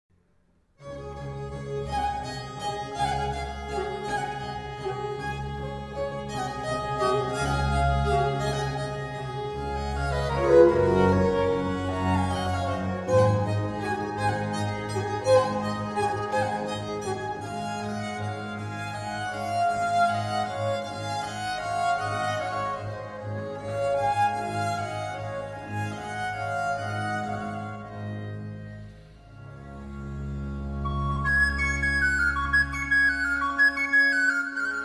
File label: Allegro, For Recorder, Strings and Continuo